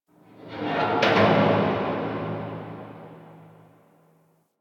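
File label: Distant Metal Garbage Wronk; Warframe; Nineteen Ninety Nine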